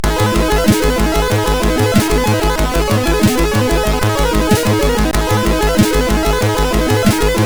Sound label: Outro, CSG8580R5